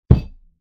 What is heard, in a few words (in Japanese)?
外側からを壁を叩く音